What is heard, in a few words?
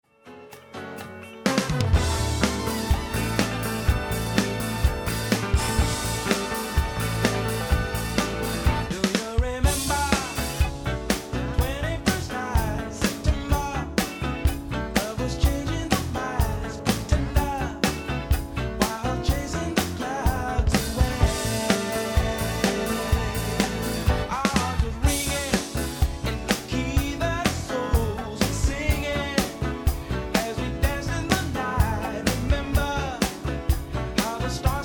smooth soul; surReal